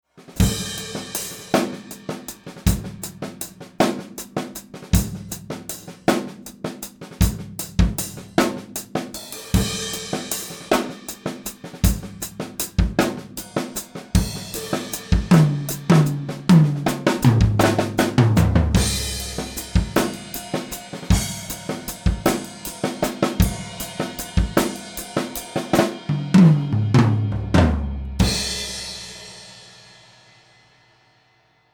cheap mics drums

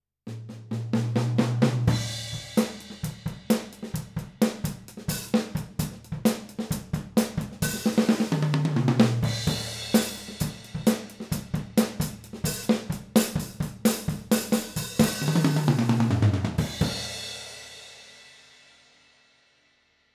midside narrow

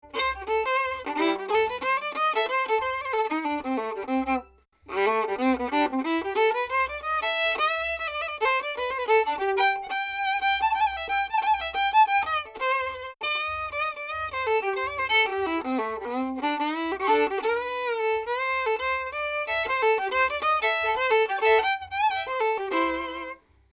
fiddle

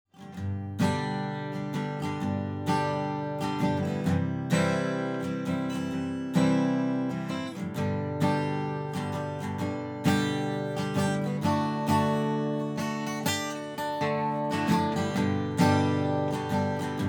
xy guitar

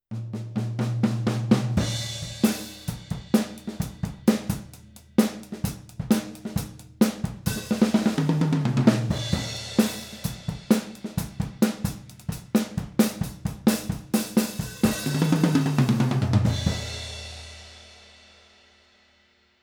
spaced cardioid, oh positions

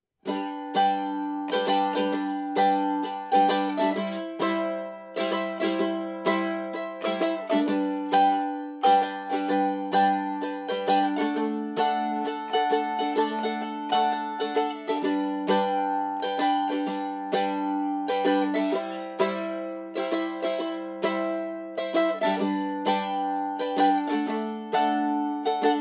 ortf mando

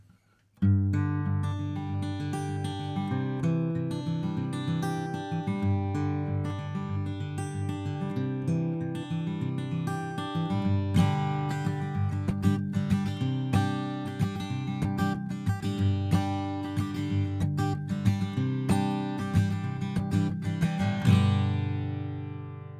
guit

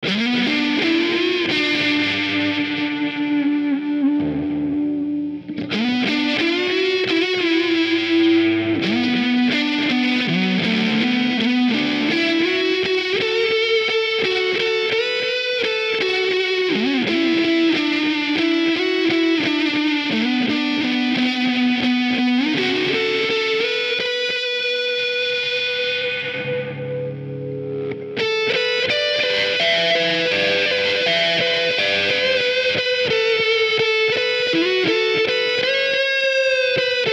guitar cab

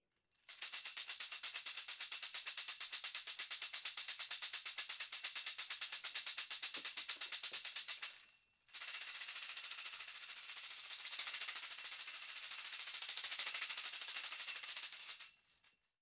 xy tambo

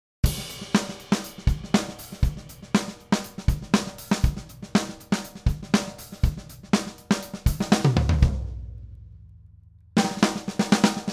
Full Kit Mics No Music

